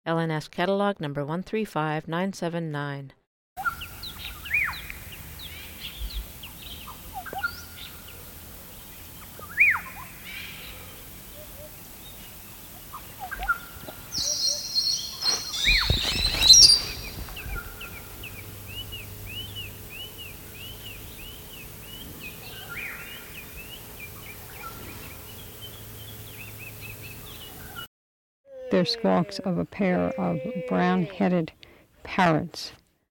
brown headed parrot

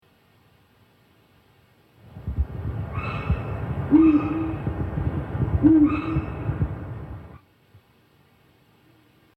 forest eagle owl

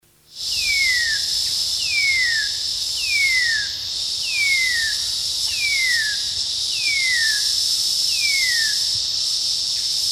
new guinea cuckooshrike

